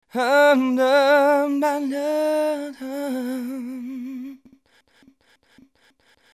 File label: Breathy tones example